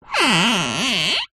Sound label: string rub wood squeak; foley